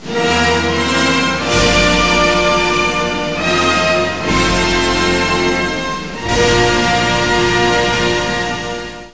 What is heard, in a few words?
ANTHEM